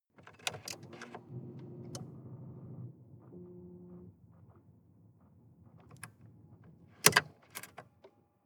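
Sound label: sports car interior ignition on off; verkehr transport; autos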